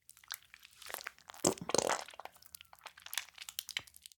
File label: squeeze tomato; sonniss gdc